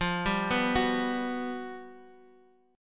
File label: G Major 7th, F; arpeggiated; JGuitar